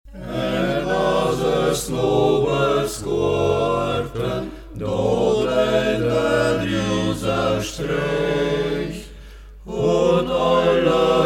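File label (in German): Männeroktett Mediasch; Ortsmundart: Mediasch; Än ases Nobers Guërten; Hegt wird gesangen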